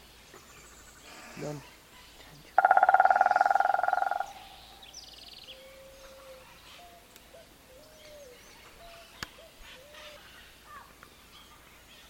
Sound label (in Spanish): Carpintero Negro, Dryocopus schulzii